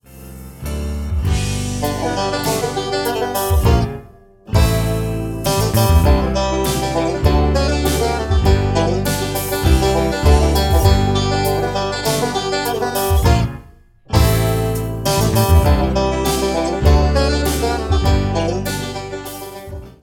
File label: The Cat Song, banjo, bluegrass